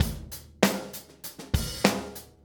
098 Steady Drums; Pop Rock; Moderate Tempo; Pro Tools Essential Loops; Digidesign

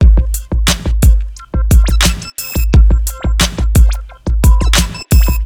HipHop Drum Combo; Digidesign; Pro Tools Essential Loops

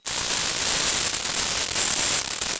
drums

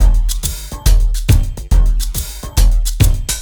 140 Dance Combo Gmaj; Dance DJ; Pro Tools Essential Loops; Digidesign

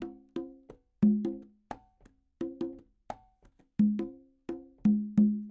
NeoSoul Congas; R&B Funk Soul; Digidesign; Pro Tools; Pro Tools Essential Demos; Essential Loop Demos; Demo Session

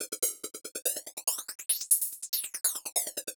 142 Techno Hats Flange; Dance DJ; Drums; Pro Tools Essential Loops; Digidesign

